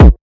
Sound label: Layered Hard Kick Drum, Hip Hop; drums; STYLE KITS (Hip Hop, Etc); Khezie Hip Hop Kit